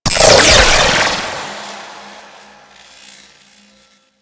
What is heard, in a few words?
Decoy Activate; Warframe; Loki